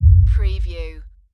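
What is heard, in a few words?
Production Element LFE Impact; Sound Effects Bible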